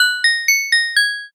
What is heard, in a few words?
music box